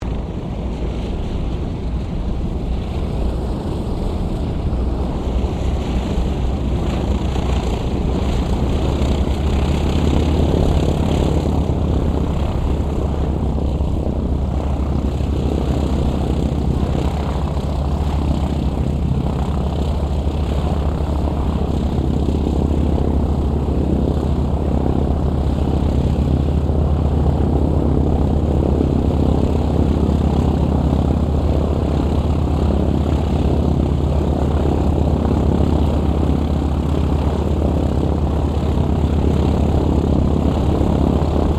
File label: AIRCRAFT WINJEEL SQUADRON 2 IDLE, 2 Australian Winjeel Trainers; Airplane; Airplanes; Vehicles